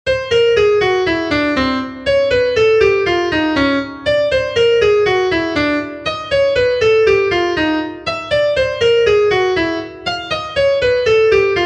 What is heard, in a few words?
whole トーン